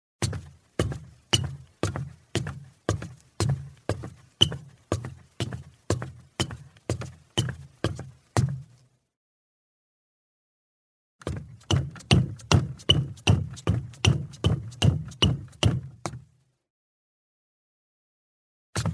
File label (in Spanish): pasos de hombre subiendo escalera de madera